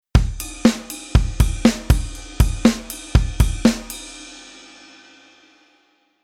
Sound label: Rhythm Basic Velo HH Ride